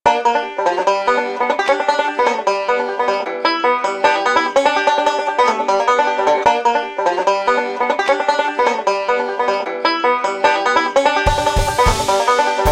Banjo chicken

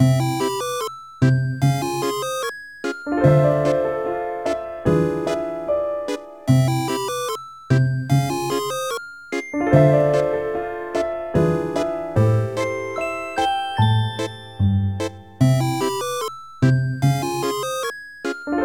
mood silly cute

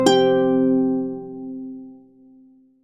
achievement unlocked; Bridge Constructor Playground; Android iOS Linux macOS mobile Wii U Windows

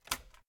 Button Press

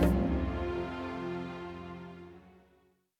Wonderment End 108bpm; Music Dialogue

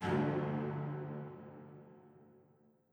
chime; chime sharp

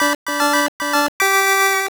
WIN; Slap Shooter; System E

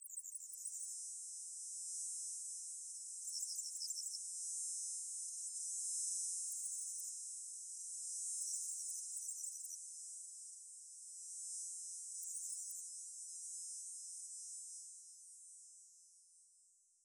Night; LISA: The Undone

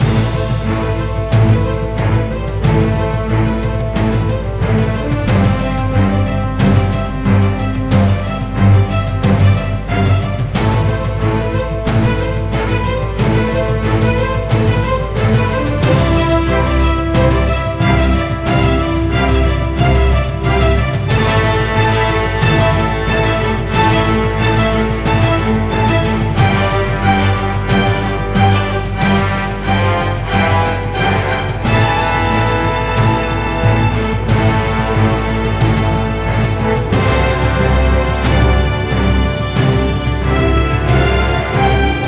Heroes Rise 182bpm; Ages of Mages The Last Keeper

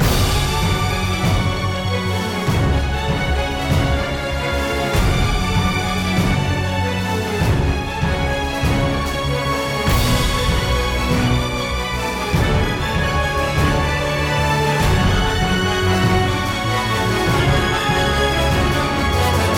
Battle Loop; Infinity Blade 3

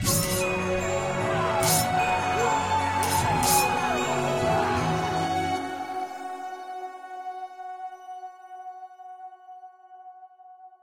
Victory Sound; Kidarian Adventures; android ios mobile gamerip 2017

